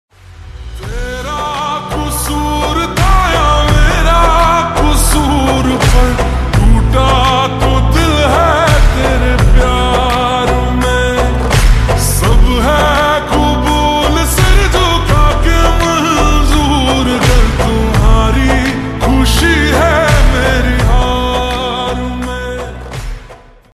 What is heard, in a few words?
Slowed Reverb Ringtone